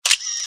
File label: camera shutter